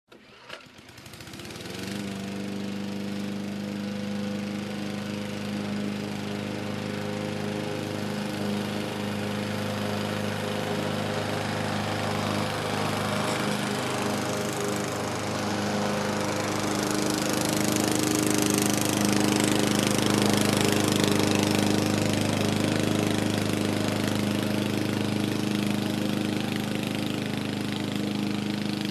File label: Lawn Mower